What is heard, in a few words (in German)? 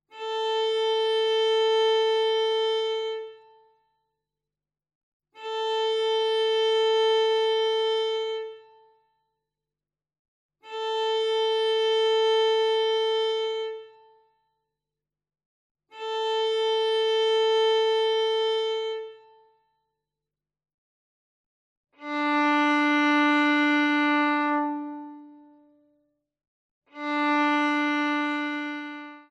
stimmtoene